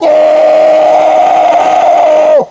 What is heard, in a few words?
scream; sic scream